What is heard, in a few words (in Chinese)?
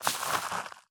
堆肥桶堆肥完成声